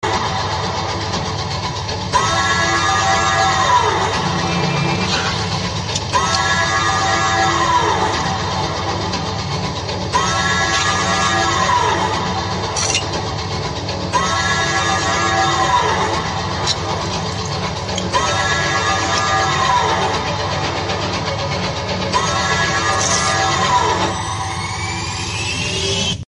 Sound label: sad bgm theme; kgf chapter 2